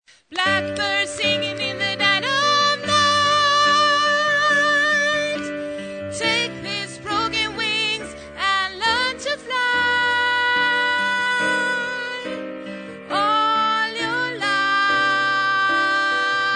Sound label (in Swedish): sopran